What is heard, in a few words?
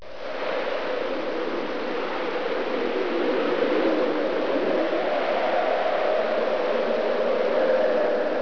winter wind